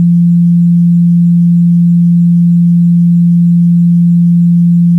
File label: fork